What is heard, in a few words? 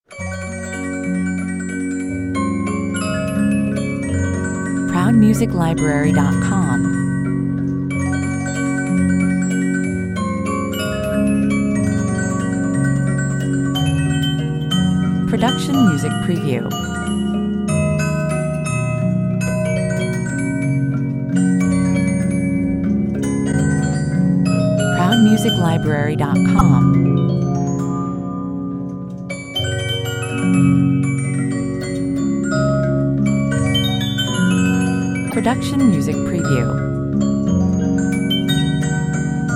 swirling waltz music box theme, 1900 Swiss Mira Music Box